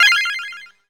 chords

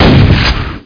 exp fire, weapons; explode; Quake; PainKeep; PC Gamer 46

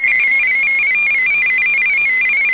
milling wall

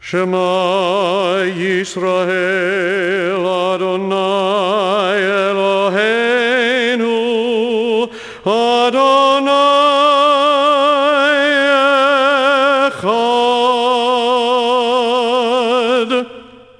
cantor; Compton's Reference Collection

